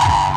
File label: BD Distortion, Bassdrums; Vengeance Samples